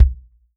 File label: REAL DRUMS POP KIT